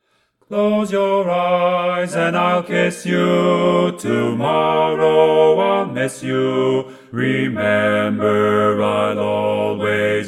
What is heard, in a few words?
Bass right